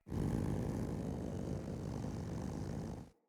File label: Vocal Idle; Warframes; Khora